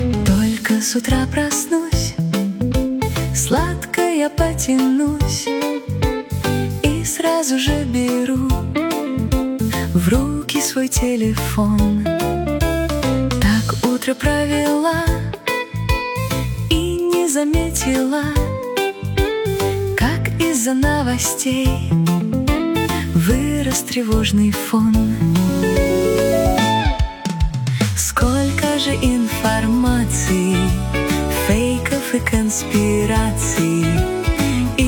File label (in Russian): trevozhnyj fon